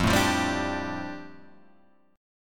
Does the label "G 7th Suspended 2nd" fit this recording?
Yes